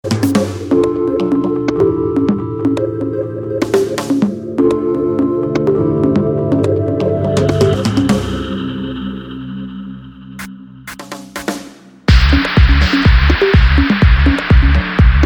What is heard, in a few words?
chime river